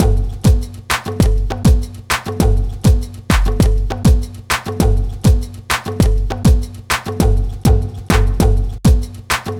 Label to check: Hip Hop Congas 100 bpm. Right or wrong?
Right